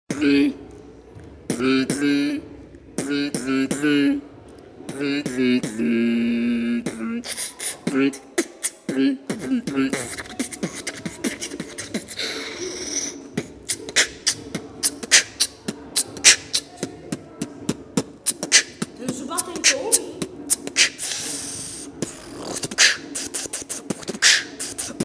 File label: beatbox